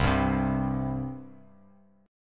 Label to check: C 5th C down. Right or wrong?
Right